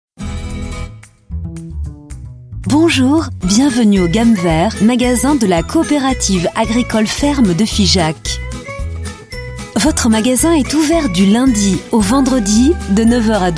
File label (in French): Attente Téléphonique; Gamm Vert Figeac; telephonie